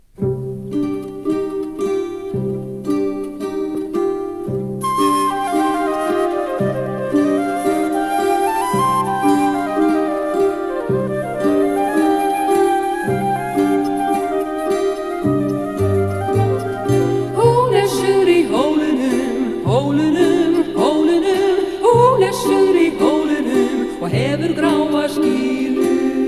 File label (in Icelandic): Grýluþula